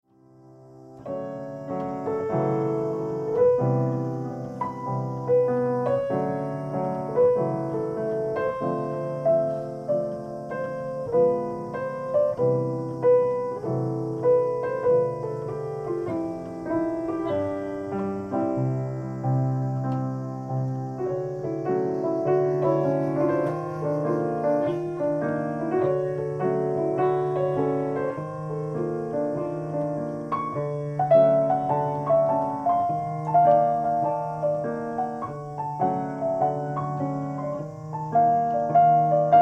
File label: piano